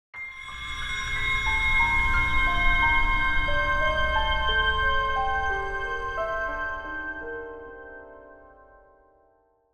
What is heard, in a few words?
Game over you lose creepy dark spooky